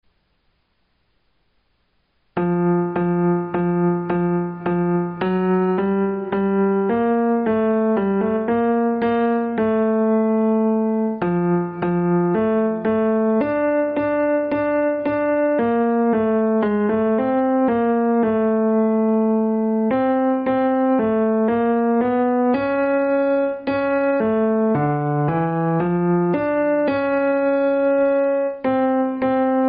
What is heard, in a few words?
tenor; o little town of bethlehem